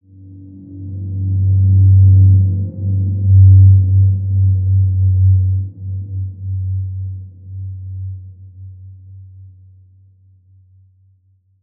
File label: Large Space G2 p, healing soundscapes